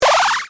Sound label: Powerup Jump